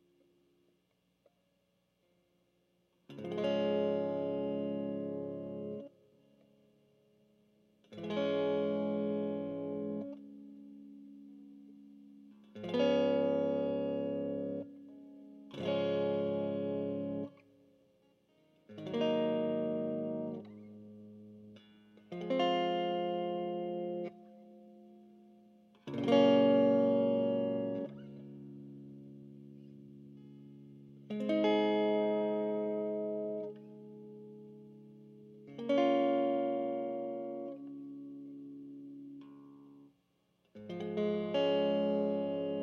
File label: chords